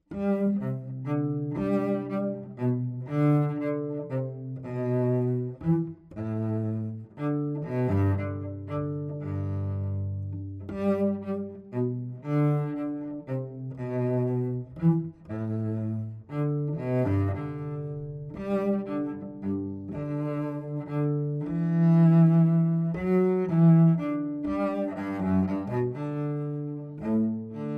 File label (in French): Violoncelle